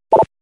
Button; Stacker; arcade gamerip 2004

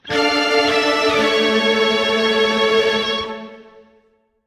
stinger loot box common; heroes of the storm full gamerip 2015; hallowsend

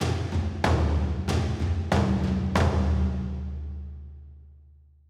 Percussion Outtro; Goblins Stems; Death Master; song of iron

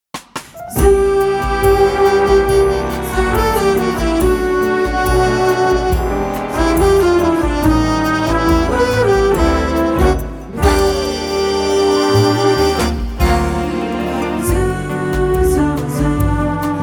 Bossa; the k.k. sessions animal crossing

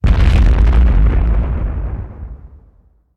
doomshroom; Plants vs. Zombies

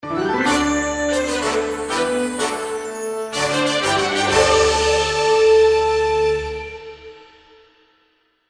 Sting Game; Azada

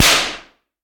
slap

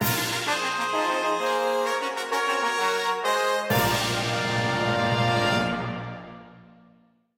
Battle Result; code shifter

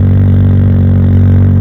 Car Lowlow Loop

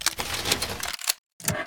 Removing Mines

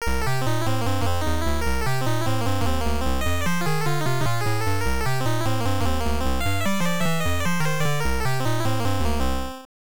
Compiling Bonus Points; Mappy; NES

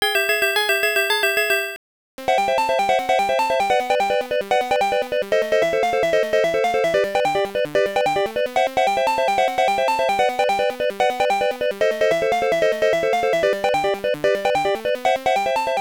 Hurry Up Music; Dig Dug II; X68000

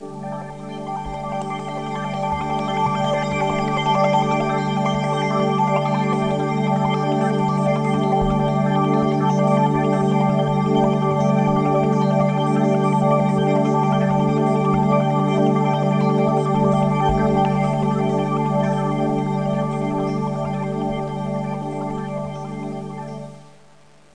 Pad; Great Eagle 2

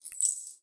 Coins

